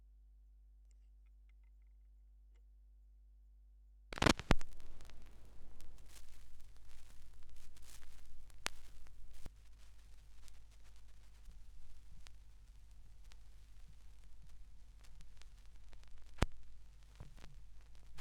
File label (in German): schallplattengeraeusche